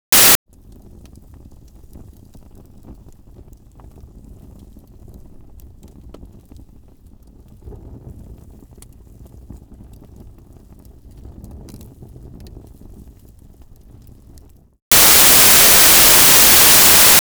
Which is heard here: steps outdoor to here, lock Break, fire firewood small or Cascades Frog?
fire firewood small